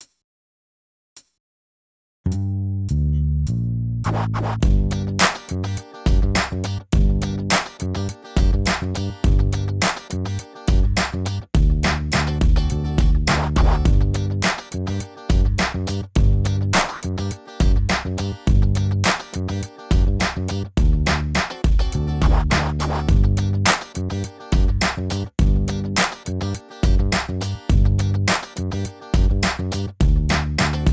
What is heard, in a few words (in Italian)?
Rap di fiuto Strumentale